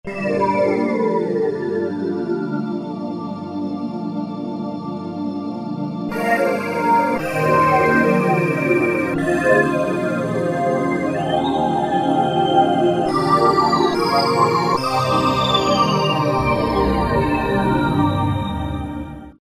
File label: synth; syn-1KeyStak; E-mu Orbit V2